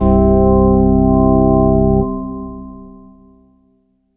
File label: Korg M3R Pipe Organ C1